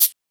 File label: Shaker; FLPRO